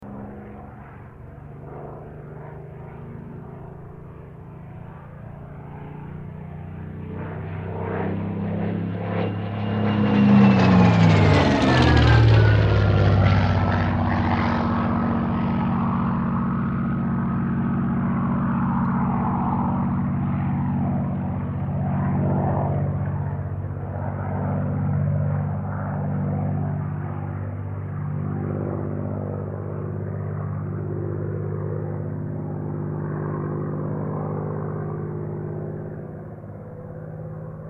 aircraft supermarine spitfire mk-xvi pass; 1944

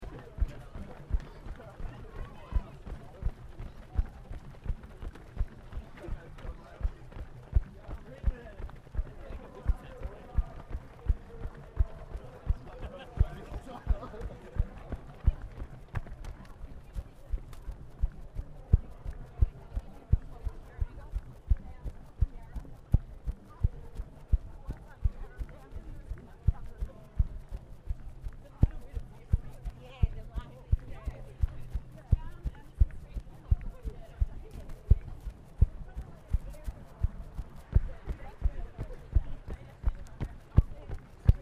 run melbourne

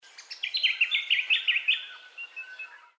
bird lithgow call stereo

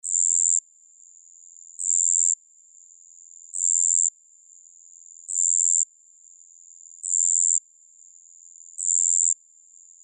japanese crickets isolated single loop

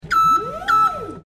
forklift hand trolley lift operate stereo

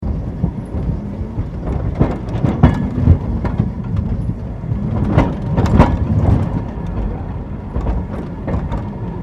portland tram

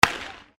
athletics starter gun fire

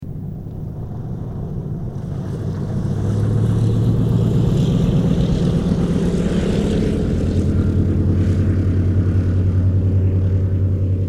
aircraft 1942 douglas dc3 land stereo

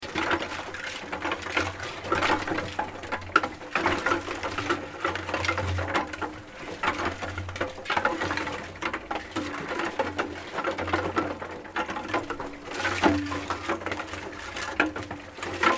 cement mixer

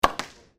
dart gun

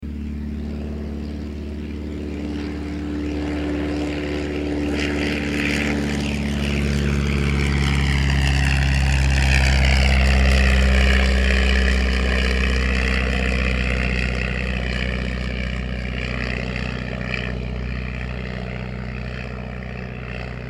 aircraft dh82a tigermoth takeoff; 1936

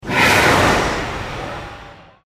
gas flame

